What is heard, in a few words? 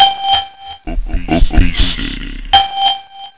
tone